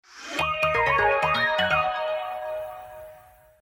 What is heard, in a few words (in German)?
Logo (Instrumental); Fritz